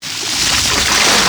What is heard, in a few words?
water swing start